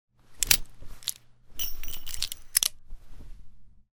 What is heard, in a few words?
357 Reload, Magnum; zps; Weapons; Firearms